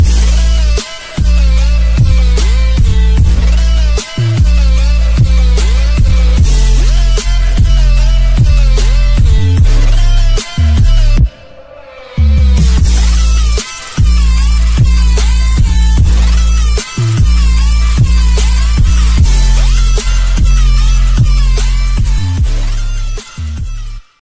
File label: new english dj remix cool ringtone